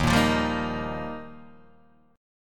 Eb Major Add 9th, Guitar, Open D

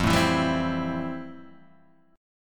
A-Minor 7th F; Am7/F strummed; JGuitar

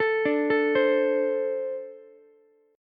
A-Minor Double Flat 5th A Ukulele; arpeggiated; JGuitar

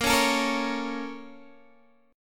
A sharp Minor Major 7th, down, Guitar, DADGAD

